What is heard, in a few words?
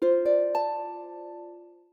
Linger, notifications; OnePlus